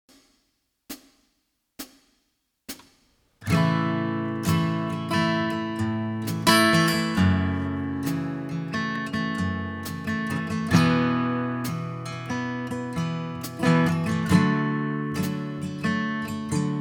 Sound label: Slide Solo, guitar